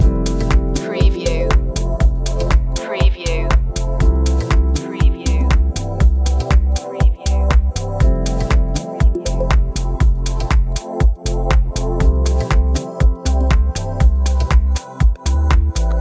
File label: loop, chill